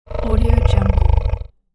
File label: low monster growl